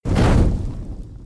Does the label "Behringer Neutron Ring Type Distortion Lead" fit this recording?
No